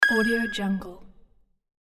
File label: interface ping single vibrato long; interface sounds